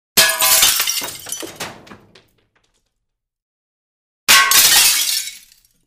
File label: glass breaking